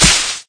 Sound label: Javelin Crash; Cookie Run; Jungle Warrior